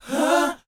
BOYZ, Vocal, R&B Dance

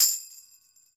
TAMB, Salsa Kit; Drums&Percussion; E-MU Producer Series Vol. 7 – Old World Instruments

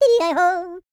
YODEL; ILIO; Vocal Planet

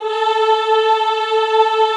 EHH A3, Extended Classical Choir; Best Service

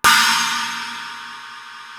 PANG; East West; Global Sounds

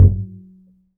Upright Acoustic Bass

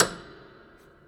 Prepared Piano; Battery 3 Sample Kits

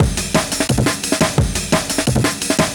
DNB BREAK

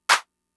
Clap; Fricke MFB-522

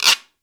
GUIRO, PERCUSSION; GM PERCUSSION KIT; AKAI S6000 CD-ROM - Volume 5; GM PERCUSSION KIT 2

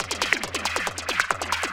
percussion loops 138, Techno; PS-45

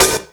SD HOU, Dance Mega Drums; Best Service

